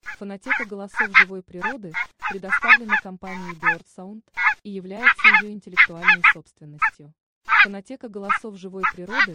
Казарка б щекая; OCA FACCIA BIANCA

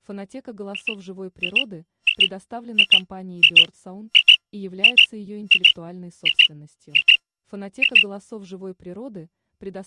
Перепел; QUAGLIA

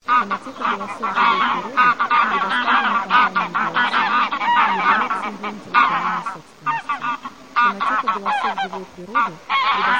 Белолобый

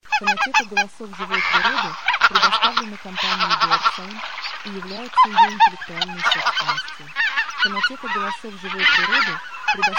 Белолобый; OCA LOMBARDELLA

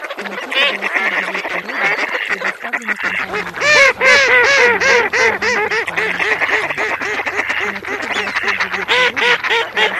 Кряква; GERMANO

